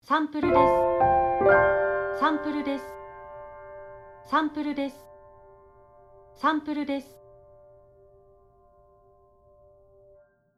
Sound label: Piano